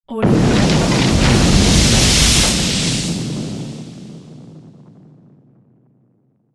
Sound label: debris from explosion